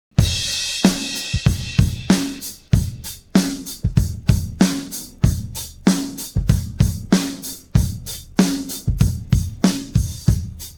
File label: pattern, drums